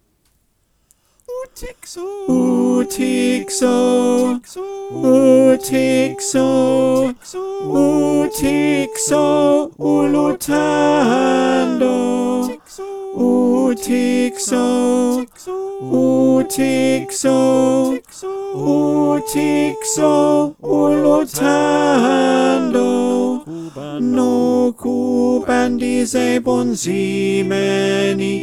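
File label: tenor, choir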